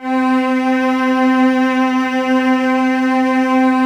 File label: cellos C 4, String Ensembles; Miroslav Vitous; 90 s Sample CDs